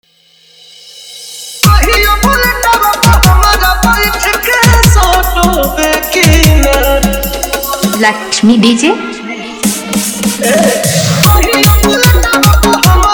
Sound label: Bhojpuri Blast Dance Remix